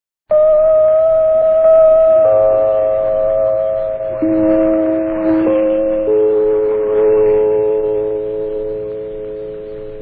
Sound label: interval signal; vofc